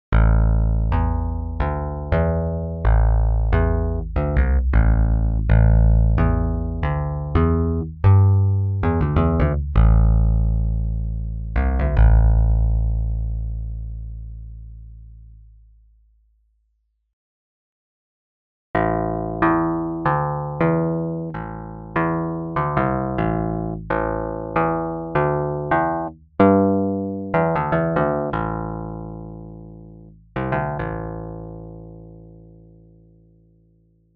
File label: Bass guitar